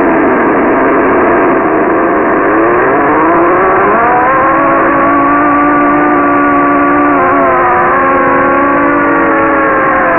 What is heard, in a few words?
icefloe; Sound from which spectrogram was generated; SOUNDS FROM MARS; Icefloe Sound Sample